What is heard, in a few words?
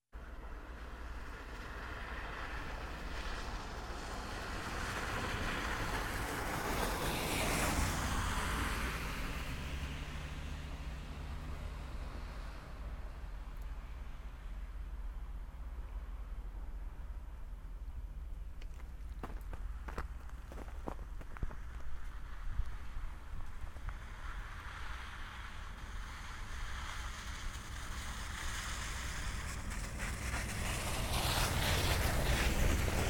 Walking on Snowy Road